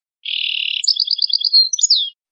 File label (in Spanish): gorrion; campero